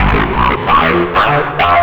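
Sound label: Guitar Dredge, Drum N Bass; Sonic Foundry (Sony Creative Software); Knutrix Drum & Bass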